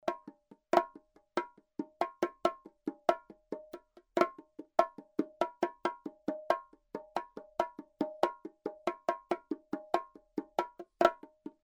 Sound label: Bongo